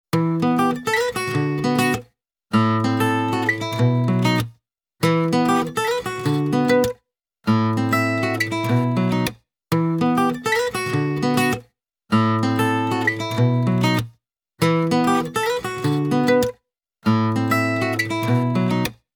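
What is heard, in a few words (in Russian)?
romanticnyj gitarnyj proigrys, romantic guitar interlude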